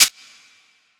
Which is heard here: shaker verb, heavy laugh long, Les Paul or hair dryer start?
shaker verb